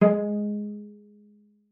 cello pizz G#3; 90 s Sample CDs; Xsample VOL-5

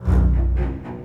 GRO, Orchestral Loops; 128 PREDATOR

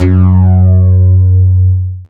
BASS, F#2; Zero-G; Chemical Beats

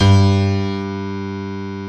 AC PIANO, JV 1080; Pro-Rec - Synthsonic

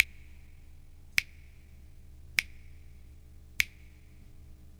snaps, Percussion

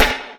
snare (hip hop); Sonic Foundry (Sony Creative Software); Ugly Remnants VOL-2; Beatstuff

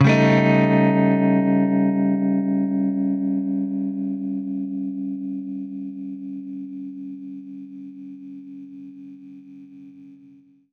Baritone Chord A7b5, guitar chords

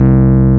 EP, Hip Hop; E-MU Formula 4000 Series Vol. 1; Hip Hop Nation

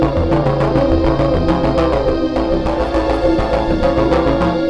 philter (mr scary); Sonic Foundry (Sony Creative Software); Ugly Remnants VOL-2

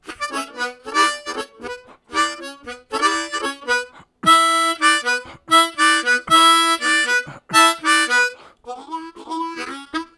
Octaves, Harmonica; Zero G - Harmonica

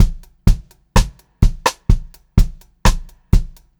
126 Pop-Rock Drumloops; 126 POP 4

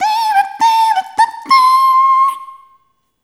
Rhythmic A

